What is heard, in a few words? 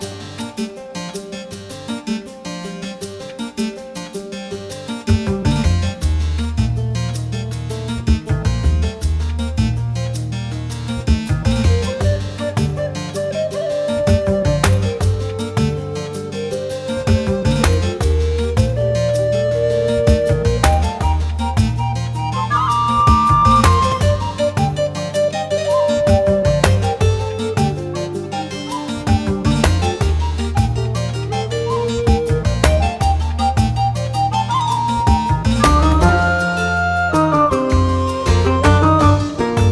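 forest background music loop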